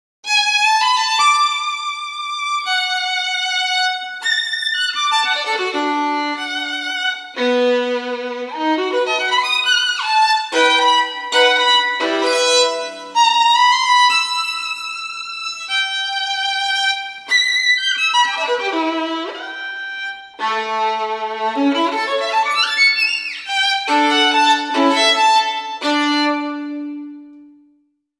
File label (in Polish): Skrzypce